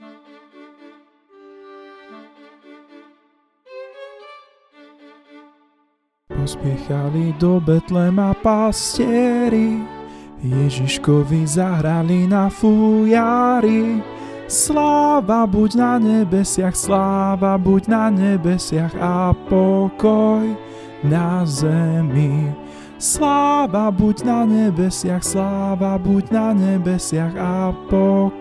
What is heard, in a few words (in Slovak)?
Sopran